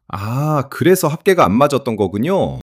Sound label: surprise